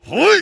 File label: engineer pain sharp